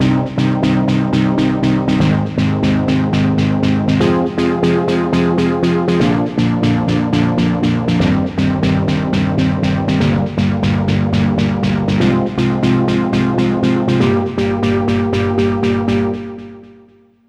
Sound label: Orb Synth